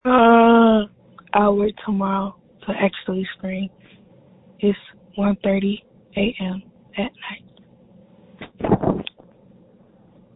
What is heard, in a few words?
screams